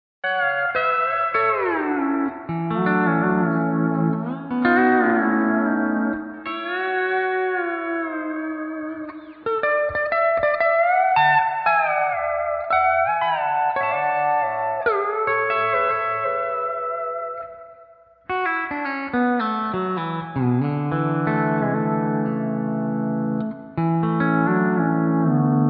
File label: Room; ToneX Pedal; Room Reverb